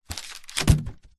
foley balloon foil small inflated drop on carpet floor